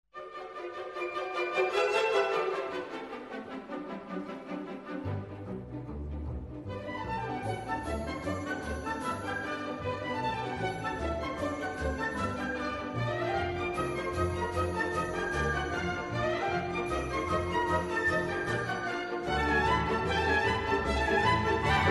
Quick Polka

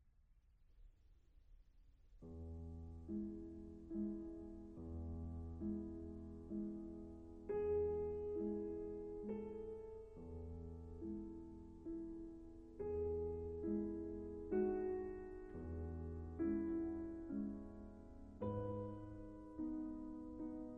Piano Concerto; ravel

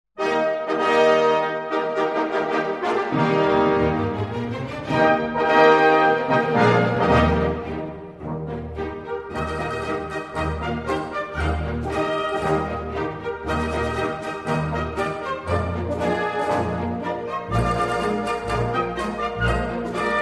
Spanish March

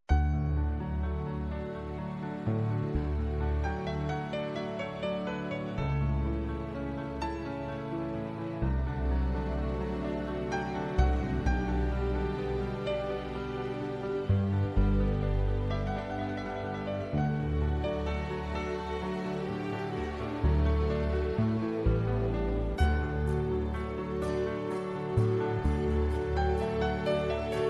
The Piano On The Wave

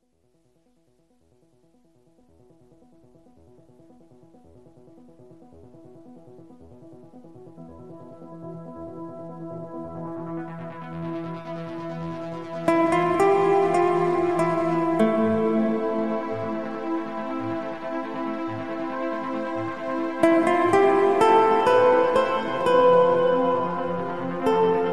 Flowers Love Guitar Version

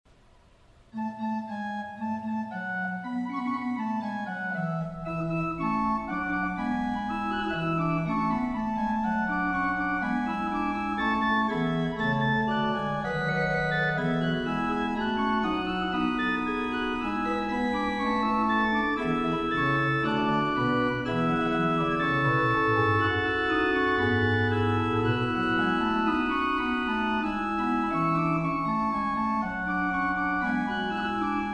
Fugue in A Major

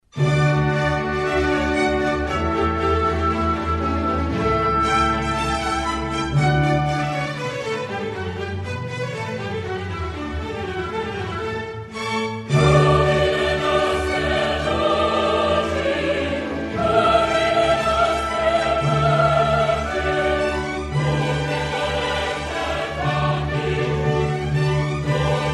Chorus

